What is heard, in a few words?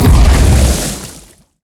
blood laser strong